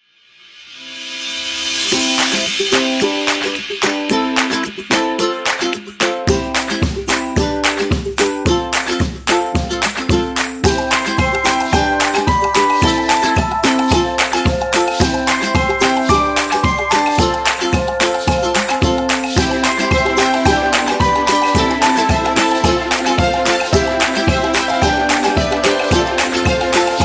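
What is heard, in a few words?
Farm, Upbeat and happy background music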